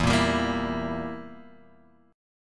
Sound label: E Diminished E down Guitar Open G